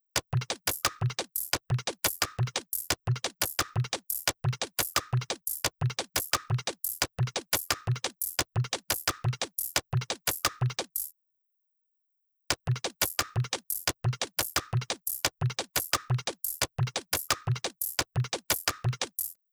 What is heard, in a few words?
Perc Loop 140 BPM; ハイハットなどのループや、; Cymatics Titan